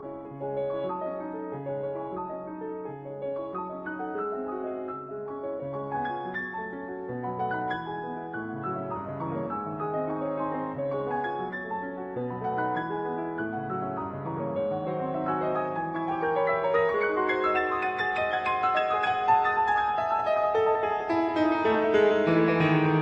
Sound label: Piano Sonata in C sharp minor Allegretto; Bortkiewicz; Piano Sonata No 2; Op 60